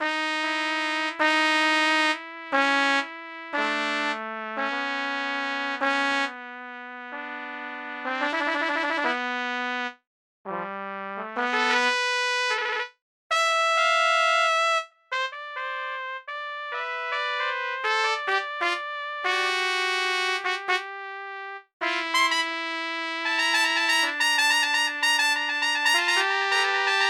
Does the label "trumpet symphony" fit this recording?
Yes